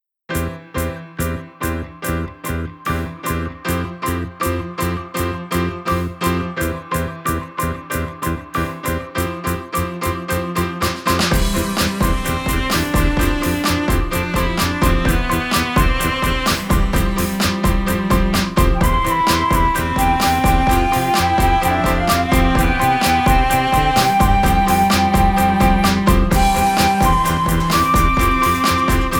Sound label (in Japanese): 始発電車